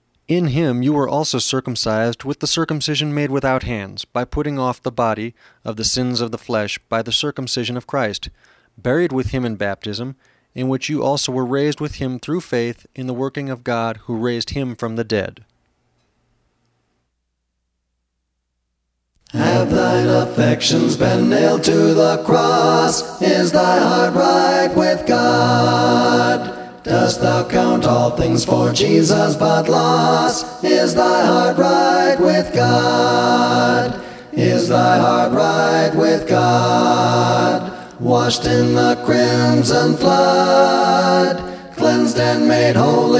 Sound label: music english